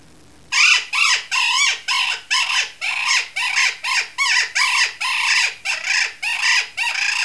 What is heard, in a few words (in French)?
Oiseau en detresse